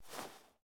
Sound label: armor; Smite; Cerberus